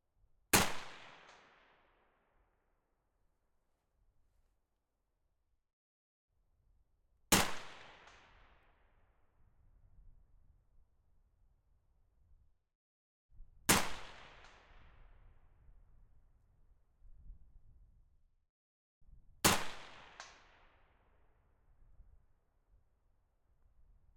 Ak 5 - FIRING - Hit Metal Armor Plate - Single Shots - HANDHELD NEAR SHOOTER; Pole Position; The Warfare 2 Library